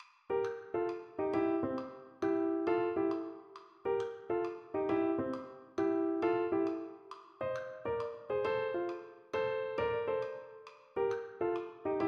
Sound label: Blues Piano Lick